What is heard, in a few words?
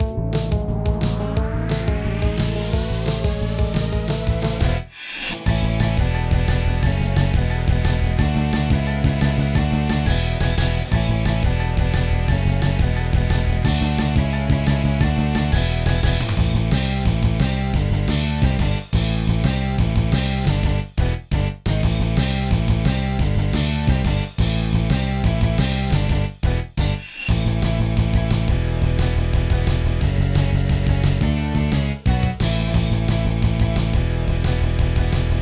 Rival Stage Karaoke; pinky street kira kira music hour pop princess gamerip